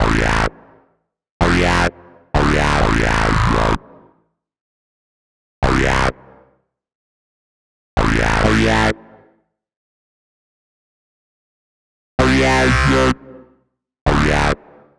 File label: 128 Am Bass, Complex Electro Synths; Prime Loops; Speakerbox